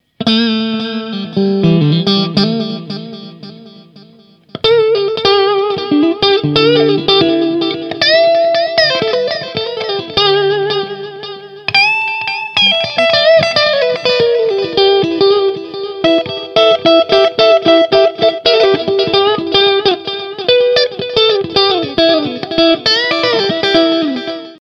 JTM45 Echo; Blade RH4

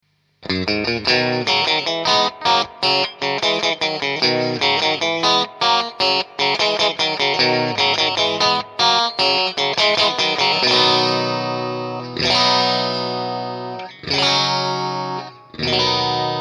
Strat mega twang

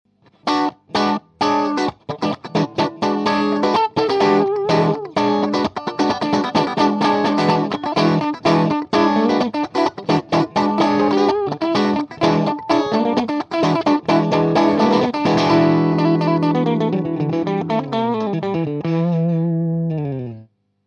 Tweed Strat funk

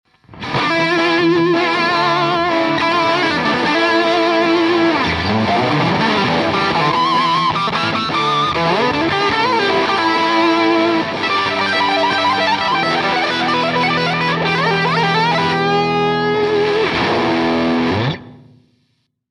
JTM45 diode 3x Les Paul

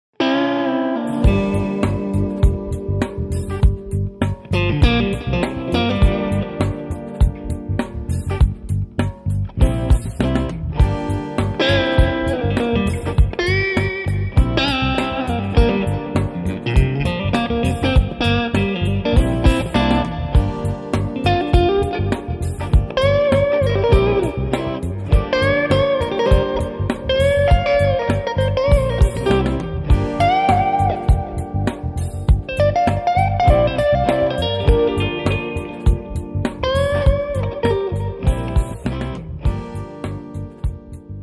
strat; 18W TMB

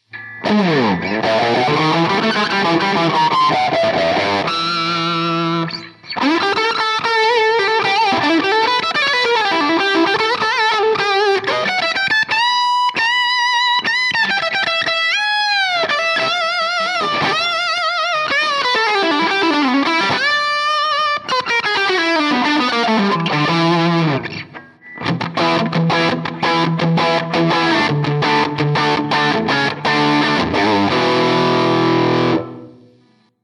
JTM45 diode Les Paul solo

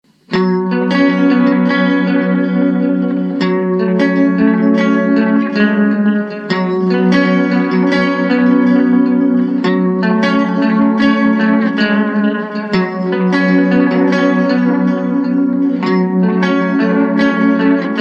Tweed 6L6 ECC82 Blade RH4 Echo

